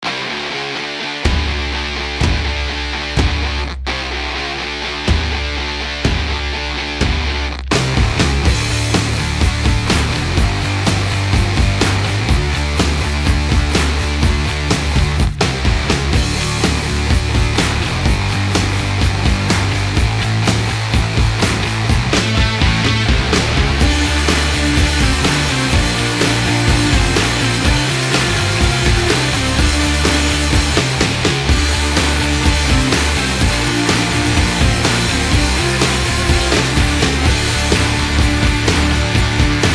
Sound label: Rock